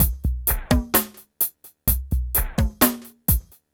PHATT BEA

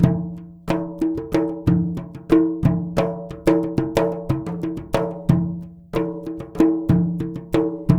MLY DRMS; Spectrasonics - Heart of Asia CD2

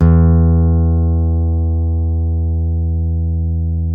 STEEL, Guitars

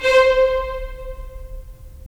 STR VLS, Violins; Violins Sh; Roland - LA Composer vol. 4

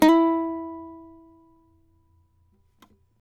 CAVAQUINHO SLIDE, Guitars

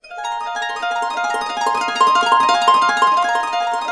EB, Harps; Complete Harps; USB Soundscan vol.62; AKAI

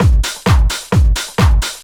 drum 130 techno; Techno Trance Essentials

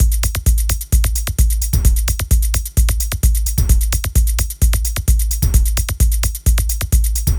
130 trance beat, Drum & Perc Loops; Trance Explosion Vol1

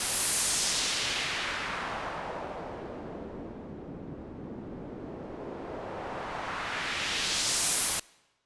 Uplifter; FX; 99Sounds; Monosounds

